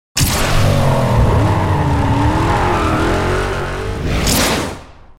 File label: Aggressive Boost; Burnout Paradise